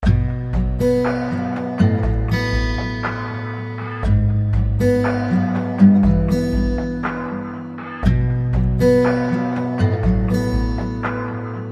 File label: Bed